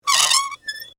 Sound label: Squeak Handle Metal, Texture, Squeak, Handle, Metal, Device, Single, Slow; Squeaks; BlastWave